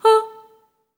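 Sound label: staccato A4, soprano, Voices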